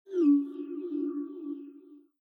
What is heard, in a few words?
Echo Sound Effect received; iOS Sounds